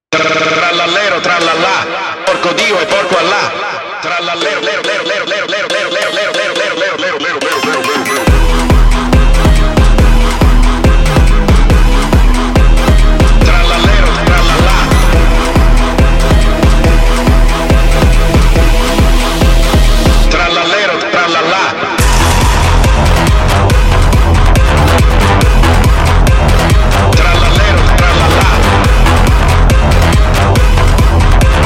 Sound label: phonk